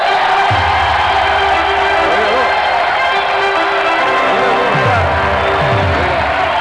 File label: Guest host/participant fanfare